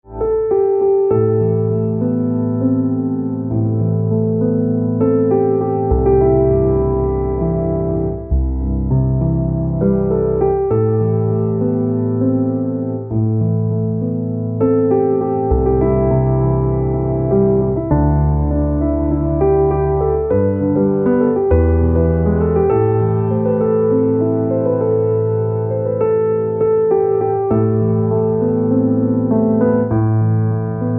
Piano Silent, instrumental ringtones

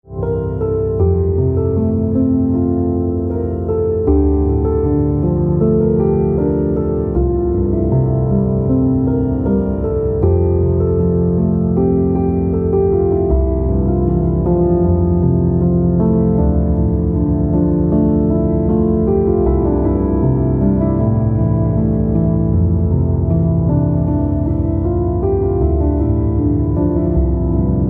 Piano Silent

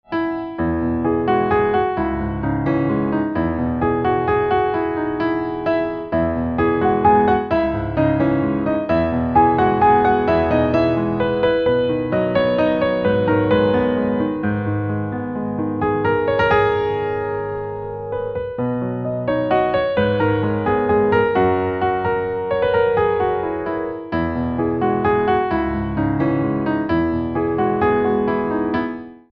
Piano

